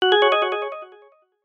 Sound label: app alert tone